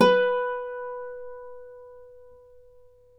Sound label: Celtic Harp, Plucked Strings